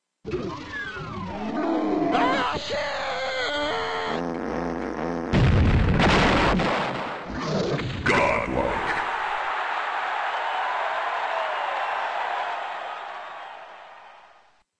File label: cyber fart; pwnt